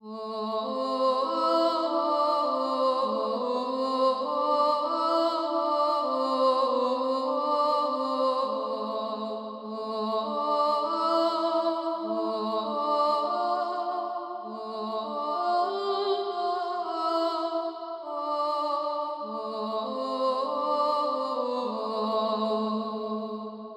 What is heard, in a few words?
Voice Sight Read